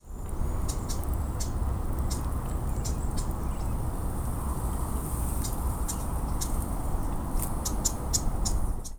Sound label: marsh wren